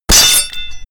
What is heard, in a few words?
sword impact gore